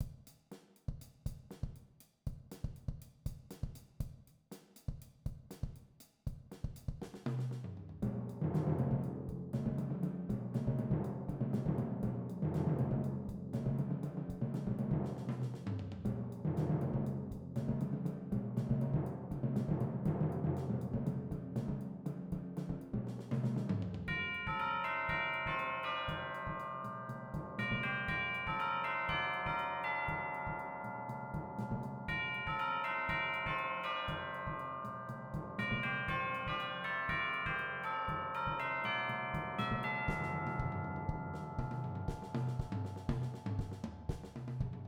Percussion Ensemble 3 Players, Instrumentation: Drum Set, Timpani, Chimes